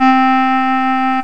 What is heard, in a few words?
clarinet; reed